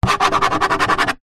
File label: foley plastic smooth hollow sharp object scratch; zapsplat